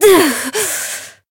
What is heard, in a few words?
Voice damaged; toova